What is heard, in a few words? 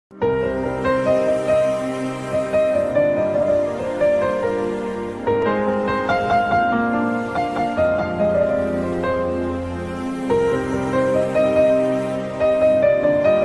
background music